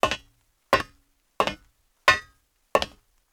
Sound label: player steps metal